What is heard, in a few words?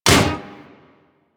Melee Hammer Impact Generic